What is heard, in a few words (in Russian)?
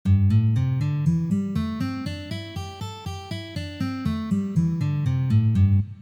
Minornaya pentatonika 1 poz